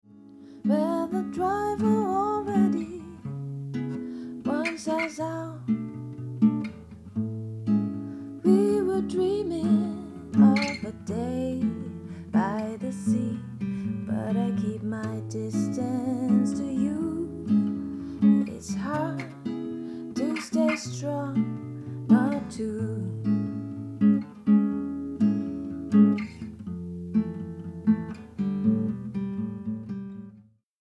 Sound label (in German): Zoom H6 Essential Gitarre Gesang Links 42 dB Rechts 16 dB